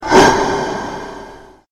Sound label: attack; slender; team killer